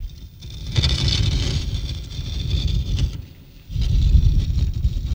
Rock Sliding Slowly

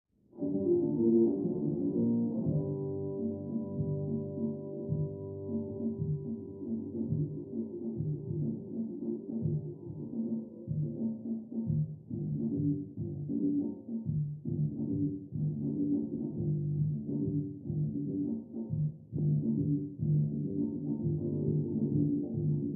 Fusion Funk

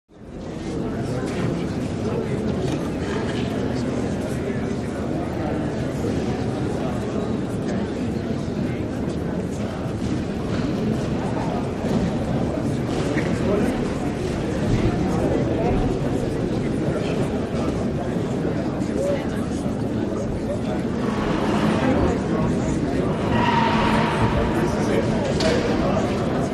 Boxing Crowd Buzz, murmurs; murmurs various

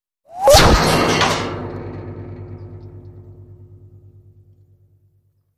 Bullet with Whir Impa, metal

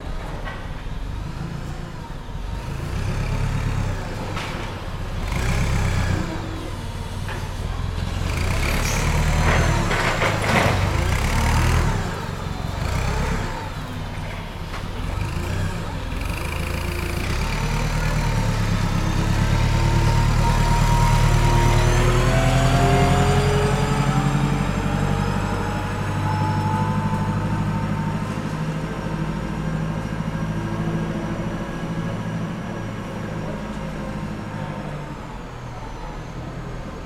Forklift Clean Movement in Warehouse, Lift Box

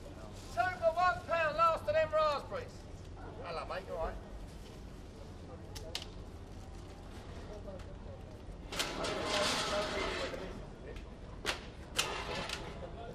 Market English Walla

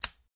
Light Switch Lamp; switches

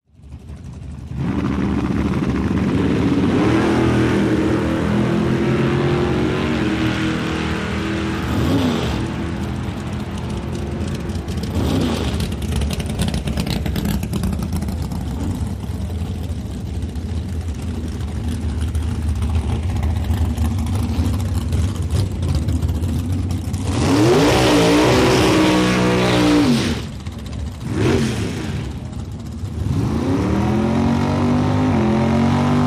drag race line, dragster

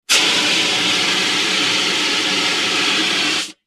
High Pitched Fire Ext, fire extinguisher

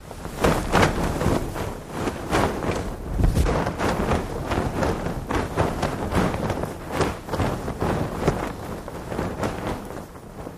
Flag Flap Shaking Parachute

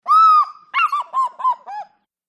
Fish eagle ( close up ) Zimbabwe

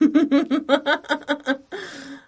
Laughter Female Shrt

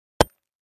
Concrete Chunk Hit

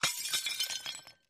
Glass Breaks Light Ce, clay & ceramic

